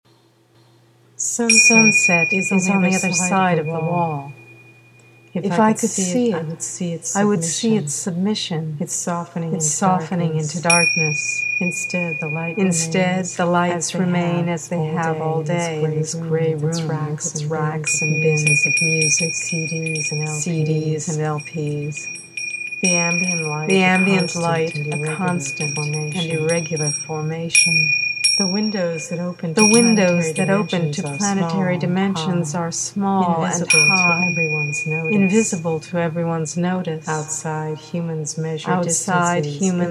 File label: BELLS; Berkeley, CA 7:23AM; December Sunwatch